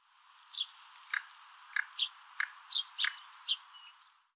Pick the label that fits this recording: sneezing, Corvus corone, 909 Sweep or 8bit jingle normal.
Corvus corone